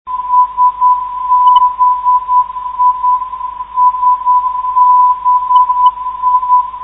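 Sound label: non-directional beacon, 332 kHz; ndb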